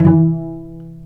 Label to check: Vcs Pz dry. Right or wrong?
Right